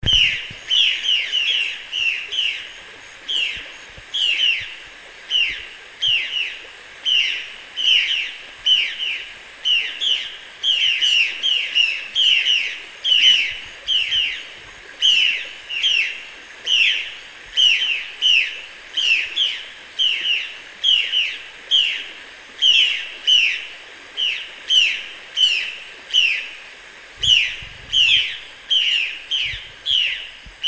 Urraca Violácea, Cyanocorax violaceus, Violaceus Jay; cantos